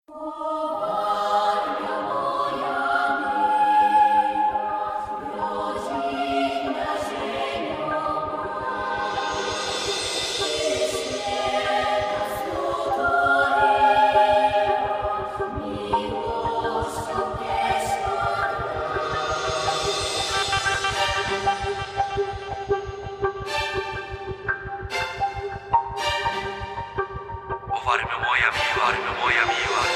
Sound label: hymn hip hop